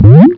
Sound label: button; raft royale